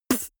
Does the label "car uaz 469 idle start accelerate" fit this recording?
No